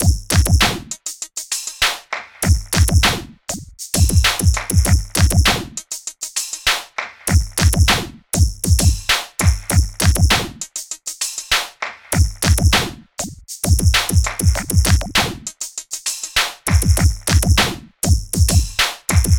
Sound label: Hip Hop Drum Loop